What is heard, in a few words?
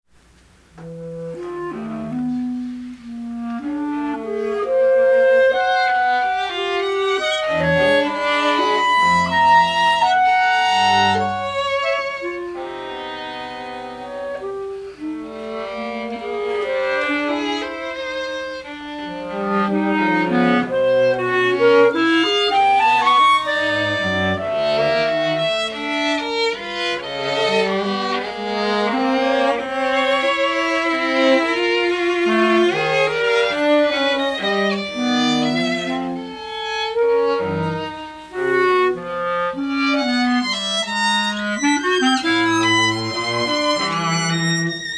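trio clarinet violin cello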